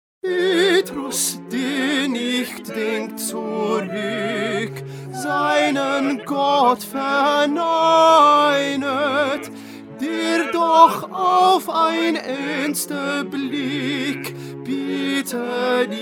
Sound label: Tenor; Bach; St John Passion